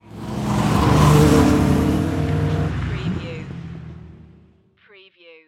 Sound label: Car Race Car Pass By